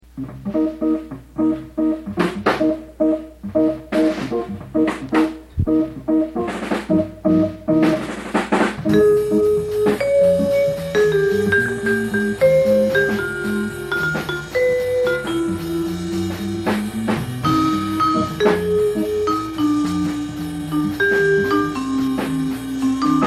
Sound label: Vibraphonist